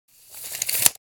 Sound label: Measuring tape retract